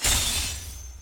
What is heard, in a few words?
MOVE; C64 Dreams; Multi-Sound Default